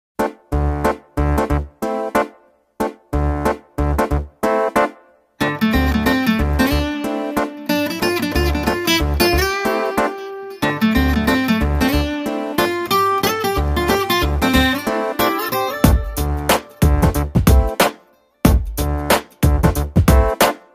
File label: Music Ringtone; Maharshi 2019